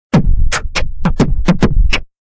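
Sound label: Beatbox, Vocal drums, rock beat (human voice); Male, Beatbox 01, vocalization, urban, hip hop; Singing (Sound Effects:Voice); VoiceBox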